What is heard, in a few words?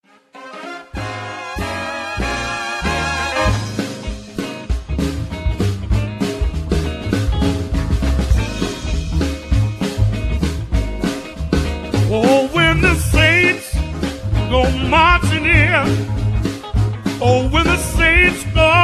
Brass